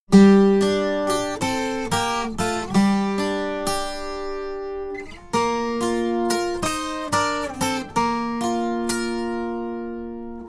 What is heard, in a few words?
12string dry